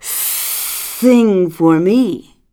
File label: Snake